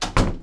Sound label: door close heavy